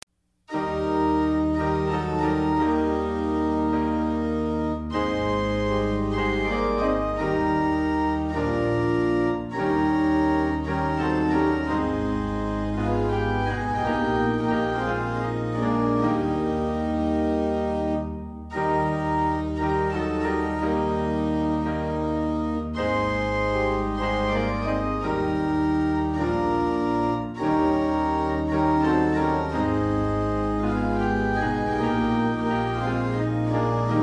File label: SDA HYMNAL SONGS TUNE